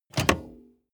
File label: car bmw e46 interior seat lock in short